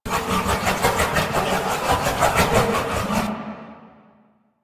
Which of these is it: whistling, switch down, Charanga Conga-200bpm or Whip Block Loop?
Whip Block Loop